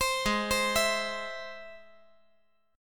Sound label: G sharp Augmented G sharp; G#+ arpeggiated; JGuitar